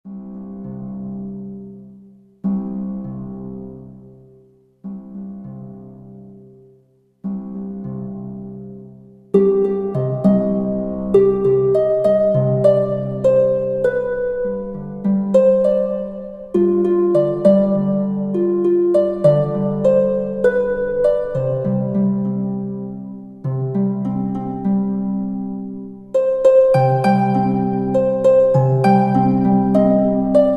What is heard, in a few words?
MIDI Melancholy Dance